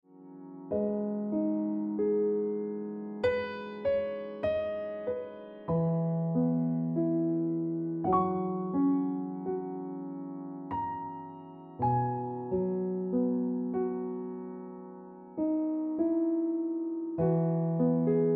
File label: Ringtone Piano Music